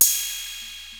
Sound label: cymbal; E-MU Formula 4000 Series Vol. 1; Hip Hop Nation; Bonus Perk